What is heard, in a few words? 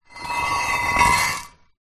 industrial metal heavy gate post pounder scrape concrete ground